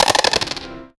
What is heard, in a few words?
orchestral hit